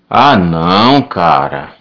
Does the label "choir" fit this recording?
No